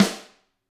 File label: GRETSCH SNR rim; ILIO; Double Platinum Drums 1